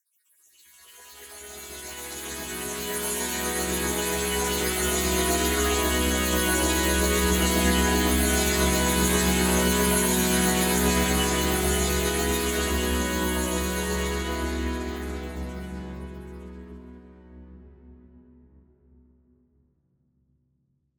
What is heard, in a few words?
Pad C#; Mrkrabs